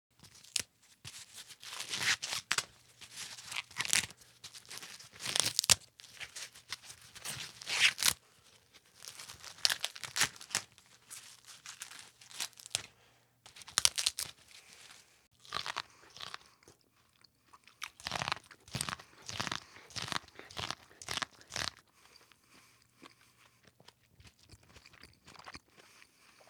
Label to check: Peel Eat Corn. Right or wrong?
Right